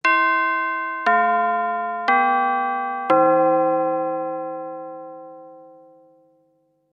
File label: doorbell